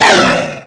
Bruise; Conquer Online 2.0

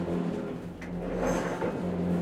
Loop Meca; Puddle

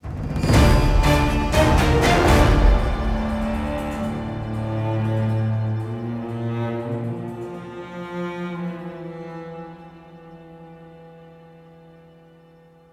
Miniboss Outro; wild blood android ios gamerip 2012

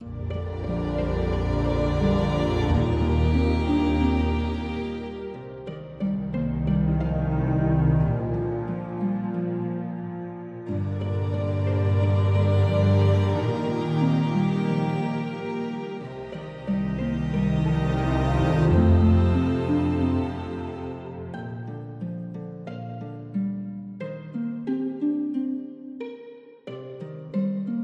Old Village Hope Loop Short

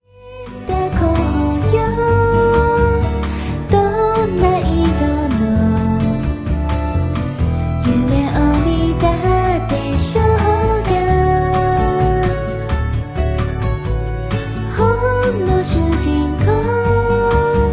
BGM xfade; Invaxion

